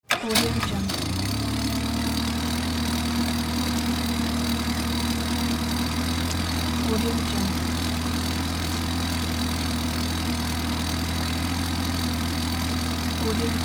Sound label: boat motor starting up idling and turning off